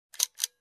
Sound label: Digital Camera Shutter Sound